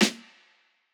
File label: snare, lofi hiphop; Legendary lofi hiphop